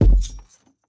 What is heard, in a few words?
Footsteps Wood Walk; The Town